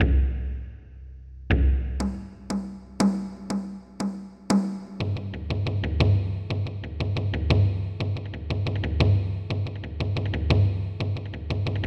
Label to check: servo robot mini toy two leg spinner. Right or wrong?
Wrong